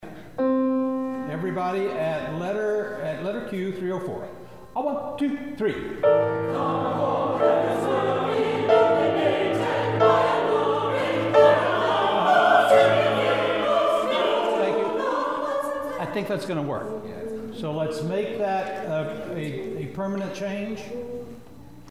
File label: FIRE SATB; rehearsal files